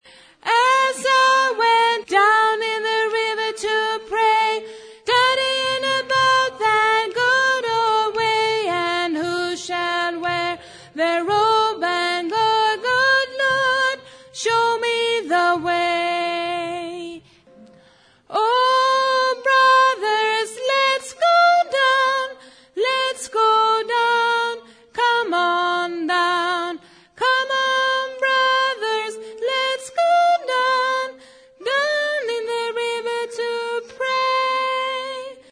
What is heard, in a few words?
tenor